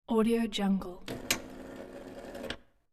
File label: internal mechanism from jukebox; sound-ideas